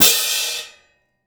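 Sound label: cymbal, Orchestral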